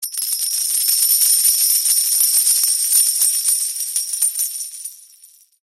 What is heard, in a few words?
reward chips; myjackpot